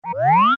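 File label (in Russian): мультяшный zoom in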